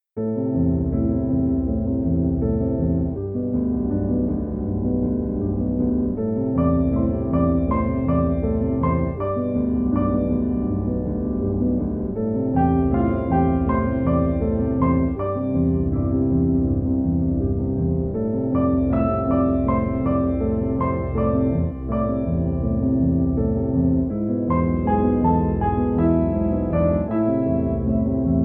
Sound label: Peaceful Piano